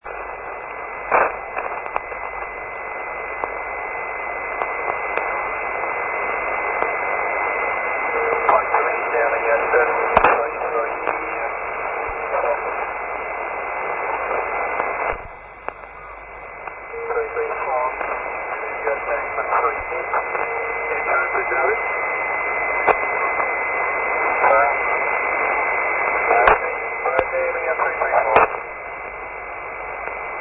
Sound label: usb; liat point salines